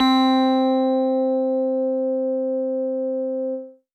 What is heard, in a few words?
Synth Prophet One Shots, Keys C; Electronisounds